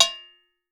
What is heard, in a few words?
AFRICAN, African percussion; Voice Crystal - Steve Reid Definitive Percussion